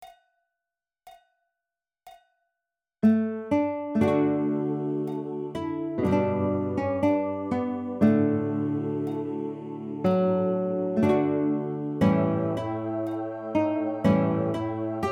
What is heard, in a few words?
Aloha Oe Guitar